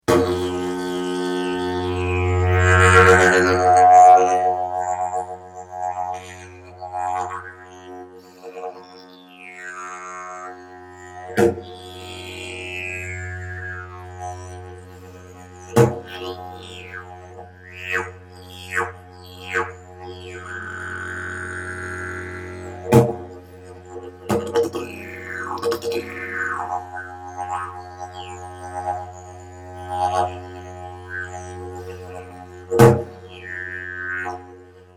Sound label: didge